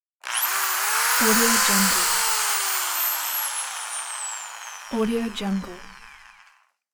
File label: drill; moonaudioproduction